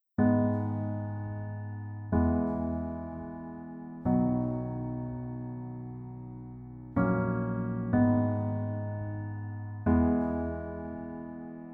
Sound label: Piano ver